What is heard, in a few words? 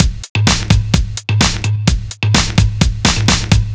Full, Drum Loops 128bpm; Zero-G; Soundclash Nu Dance Electro; Acidized Wavs; Jackin Box